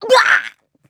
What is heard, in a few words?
death violent, grunt involuntary; covy involuntary